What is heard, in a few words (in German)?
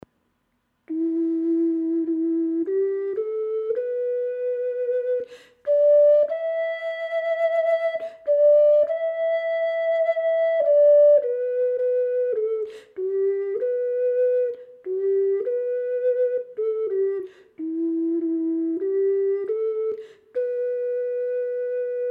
Indianisch E, ocarina